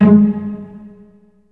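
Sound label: cello pizzicato G#2, Virtuoso Strings; ILIO; Kirk Hunter Virtuoso Strings VOL-3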